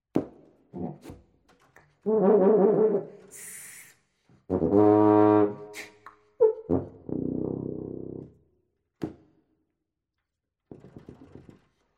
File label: solo tuba music